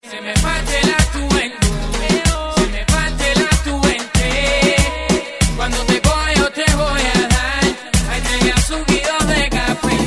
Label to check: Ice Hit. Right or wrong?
Wrong